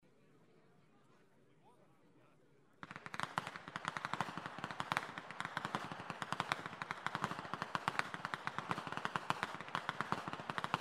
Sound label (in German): schnalzen, flachgau; ANTHERING V